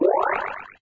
sfx power up grabbed; LG GW820 eXpo

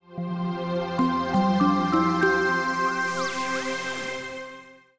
boot audio; Walton; Primo-F3i

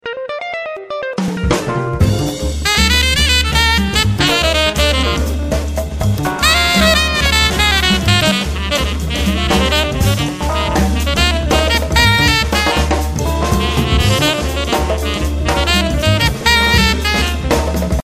Jazz Bar; Samsung; SPH-M800 Instinct

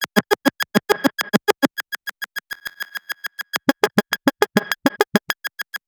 pulse, ringtones; Nothing Phone (2)

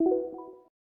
cube lock; SKY IM-A870S VEGA Iron

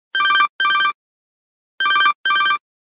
Move Bell; LG GB258

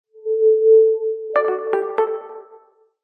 Power Up; Motorola EX300; System sounds

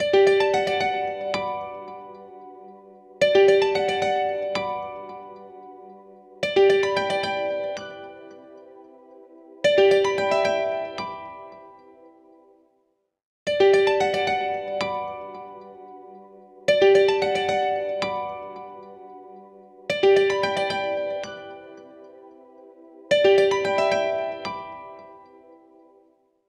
ringtones; LG Smart Folder X100S

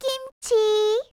cam Ready; LG; SH400 Alibi

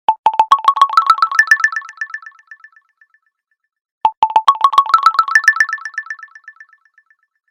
Oscillator, ringtones

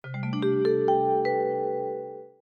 wind chime

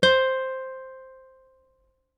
dial tone guitar; Oppo R7

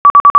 phone low batt; Samsung SCH-M490 T Omnia

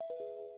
Call End; LG SV770 Waffle

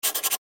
Keytone sharp; LG KH5800

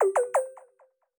Charging Started, Samsung Galaxy S21 ui

